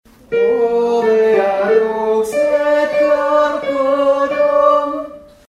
O mea lux soprani

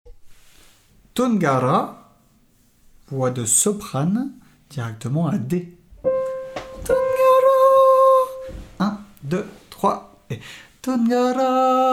Tunggare sop

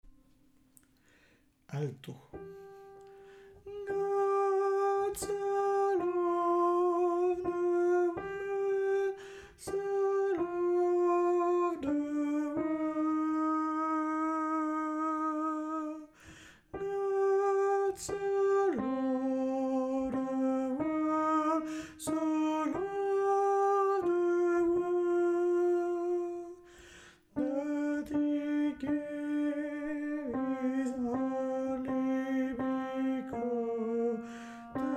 God so loved Alto

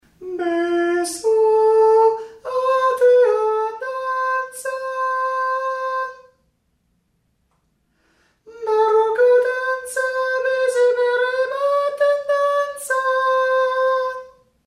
Bibi sop

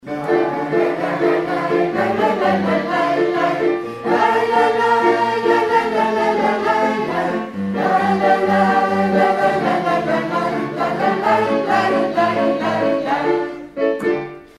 travail tzigane alto

